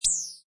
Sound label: Aliens Gun Shot, sci-fi alien gun; Sound of sci-fi alien gun, or sci-fi futuristic humans gun, fast and high frequency burst; Science Fiction Sound Effects; Lynne Music